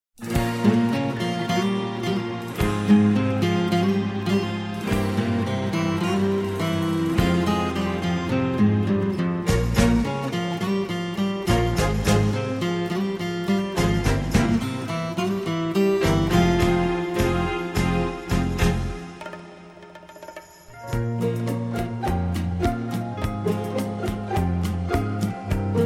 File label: Two Guitars